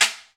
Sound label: Guiro Wood; Club-50 - Foundations Roland